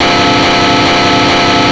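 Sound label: guitar, Jungle; E-Lab; XTC Files of Jungle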